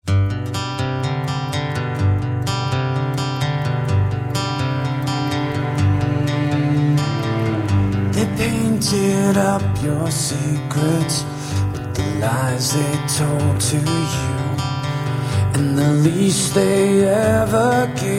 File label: acoustic